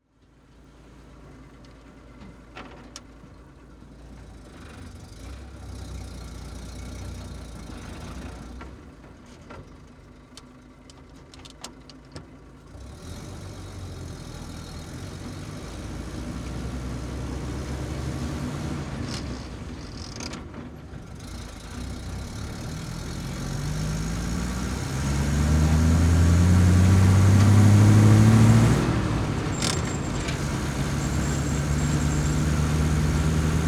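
m16 halftrack onboard medium drive gearshifts stop pause resume stop interior; Pole Position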